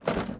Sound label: wrong